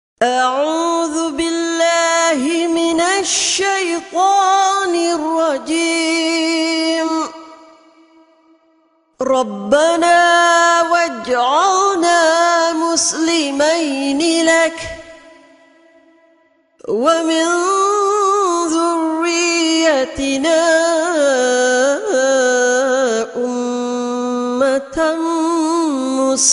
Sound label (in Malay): Surah Al Baqarah (ayat 128); Quran